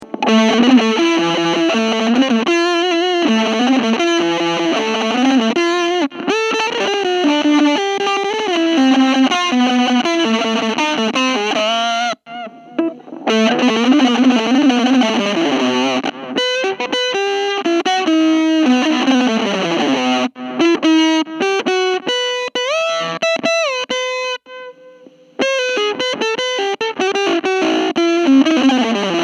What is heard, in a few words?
fretless